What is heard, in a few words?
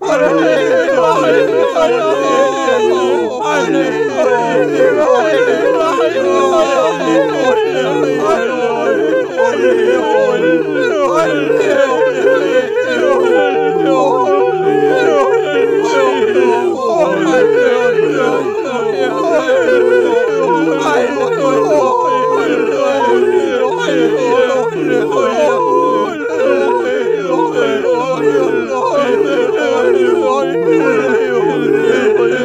Death By Yodel